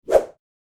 movement swipe whoosh

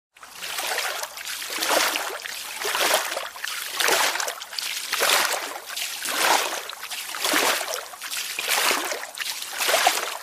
swimming sound, Jump water

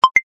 Low battery short notification; phone

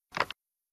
Placing Object On Table Sound Effect

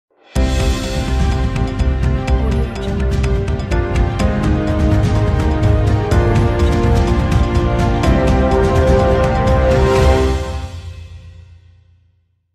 news intro sound; shows

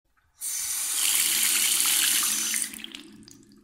Running Faucet Water Tap Sound

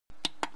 button sound

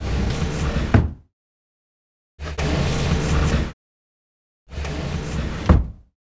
Moving Heavy Object

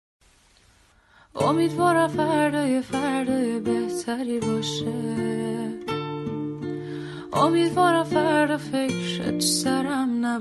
Guitar

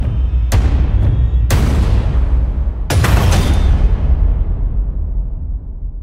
logo impact; boom

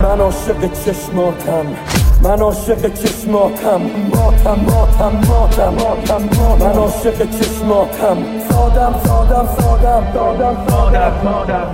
Remix

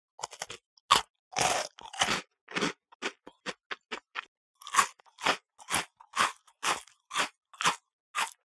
Eating Cookie

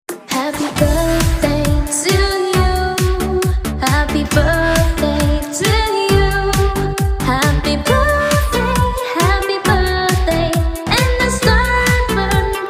happy birthday girl

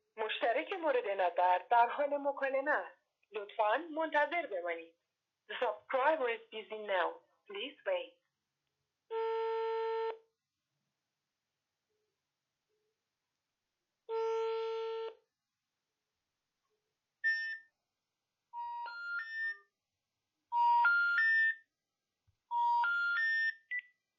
all phone sound; irani; phone sound effects

